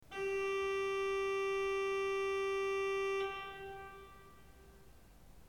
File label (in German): ton, orgel